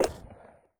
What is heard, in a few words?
menu tabs move, user interface; gmod; mw; iw8